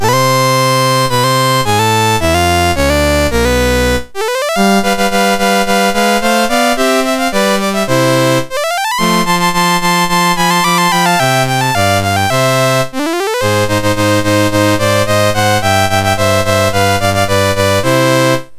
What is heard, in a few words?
Chile National Anthems, MOS6581R4